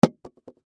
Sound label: Gift Box Drop Carpet; Drops (Sound Effects:Objects); Foley; Blastwave